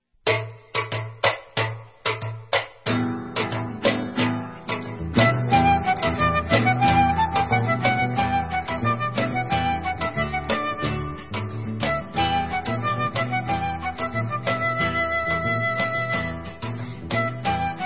Instrumental

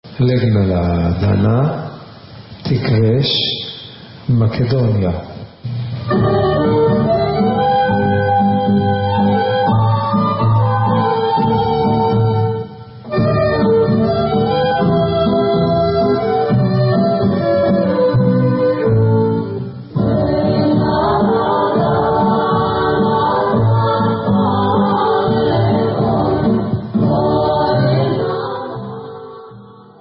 Macedonian; Legnala Dana

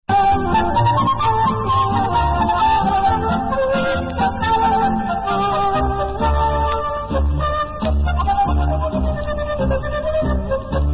Debka Instrumental

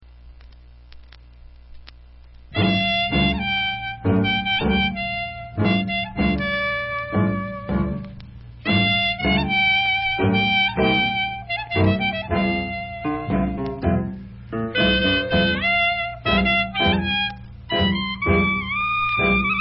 Chassidic Instrumental; Chassidic Gestures; Fred Berk

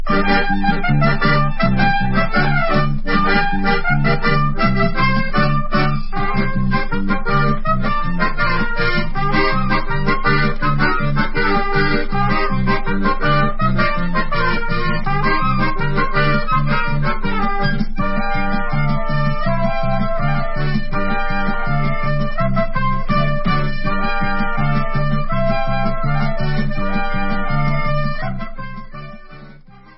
instrumental

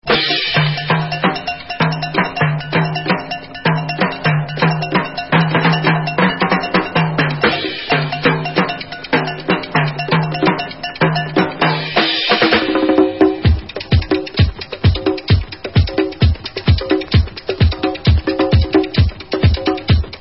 Samba

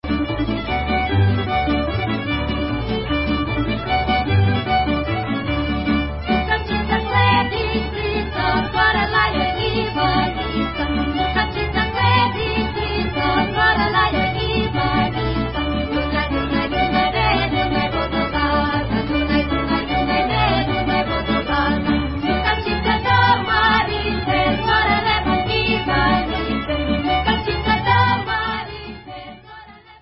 Kolo, Croatian

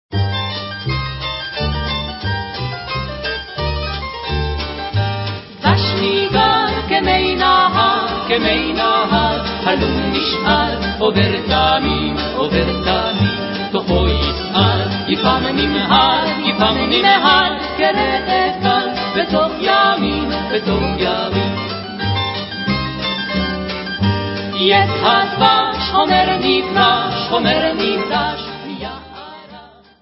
Vocal

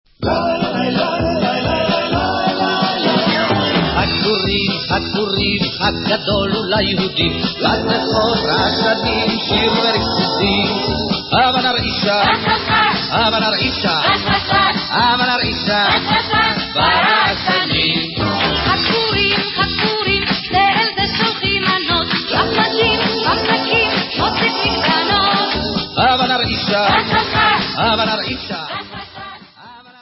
VOCAL

